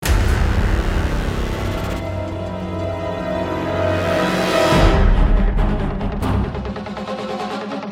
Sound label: Mx Combat Intro